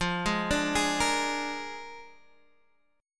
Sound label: Bb 7th F, chord